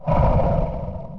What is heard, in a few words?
Ogre; Sid Meier's Civilization 4 Complete; Beyond the Sword; FfH Age of Ice; units